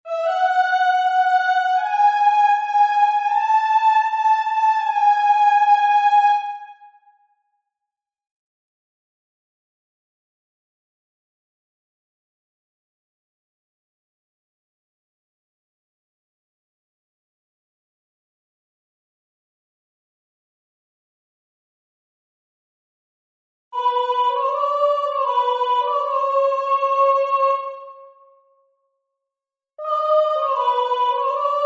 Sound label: soprano